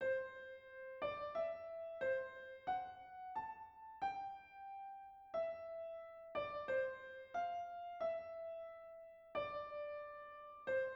Melody Modulated

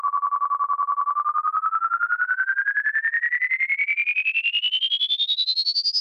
pitch up

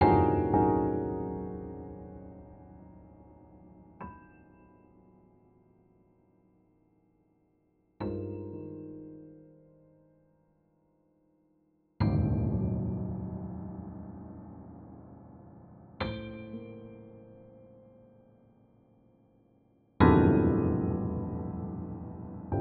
slow part (cd piano)

